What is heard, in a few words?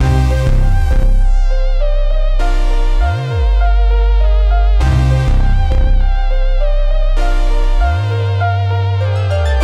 uncut funk, electronic loops